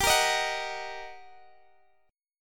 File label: G Minor Major 7th Sharp 5th G, Guitar, Open D; chordshape; down